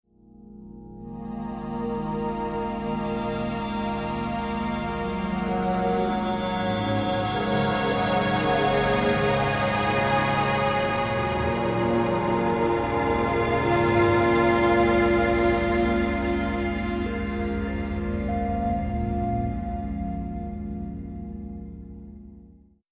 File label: Chord Wash; Beds